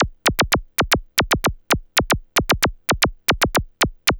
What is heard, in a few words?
Percussive; Sonic Foundry (Sony Creative Software); Machine Language